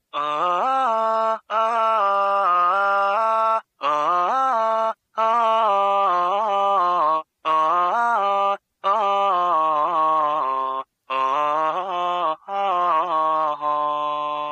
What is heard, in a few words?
ohhhh